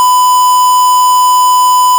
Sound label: 1k sin